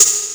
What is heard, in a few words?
ui toggle; anvil